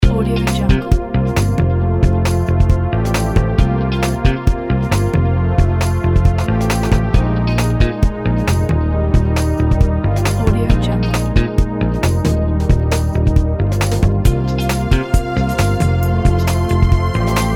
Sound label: smart and pretty loop; garsumene